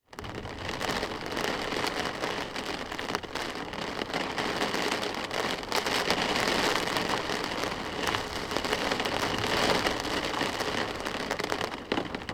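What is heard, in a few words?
weather rain medium and wind on car window internal cars passing outside; sound effects one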